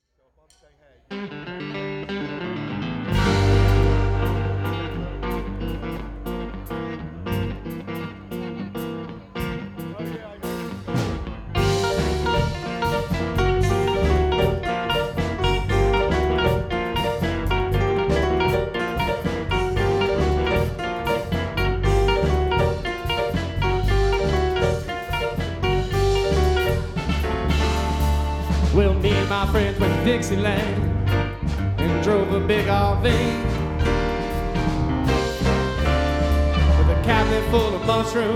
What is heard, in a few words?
Live Broadcast Mix; Live at Froggy Daze; WKZE